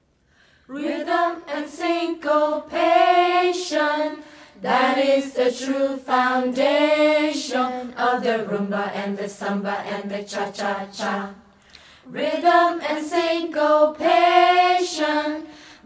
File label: Rhythm and Syncopation